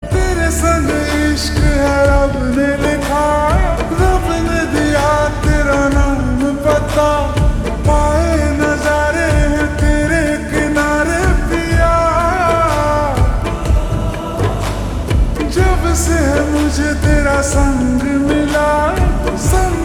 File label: Ringtone Slowed Reverb